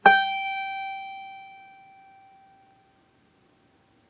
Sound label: string 1 fret 1